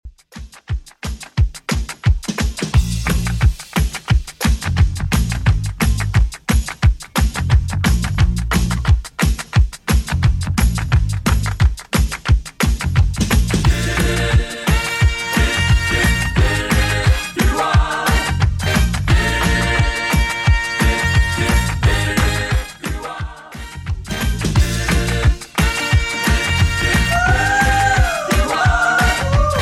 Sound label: 80s Redrum, 176bpm